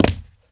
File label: jump land tile; portal 2